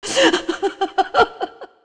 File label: woman cry